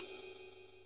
RIDE

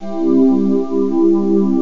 pinger, maj; Terra Sound Library; construction set; pads n strings

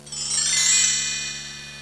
hi bell chime, percussion instruments; Sound Effects for Multimedia - Volume 1

